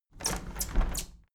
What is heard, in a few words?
window handle plastic squeak close tilt heavy; objekte; fenster